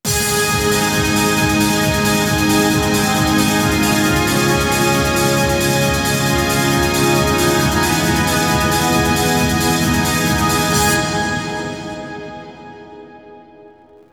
Str G#

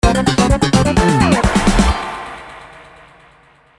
Mus Speedup; Mindwave

